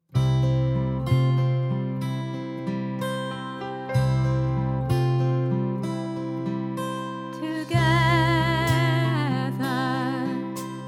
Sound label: Teaching Chorus Harmony